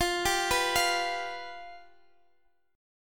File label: F Suspended 2nd Flat 5th, Guitar, Half Step Down